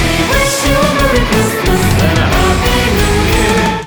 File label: Lock Chime Merry Christmas